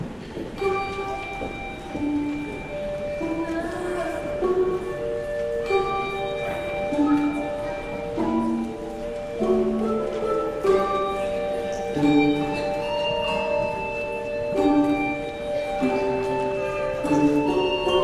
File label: Handbells; O the Deep Deep Love of Jesus